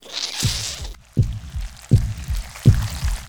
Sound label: Syringe Inject Blood Pump; SFX Kit; Foley - Misc